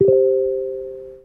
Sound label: Telegram Tone